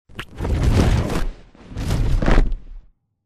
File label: flyer perched take off attacked close; boneyard; Titanfall 2